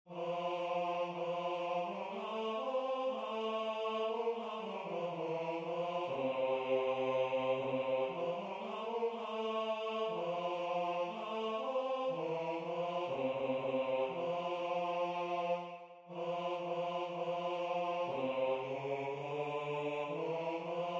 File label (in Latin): Cantate Domino Bass